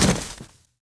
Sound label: Cloth